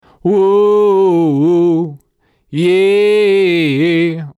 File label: Vox OC818 saturation comp NUKE